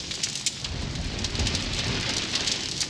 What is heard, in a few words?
multiplier wheel loop; arcane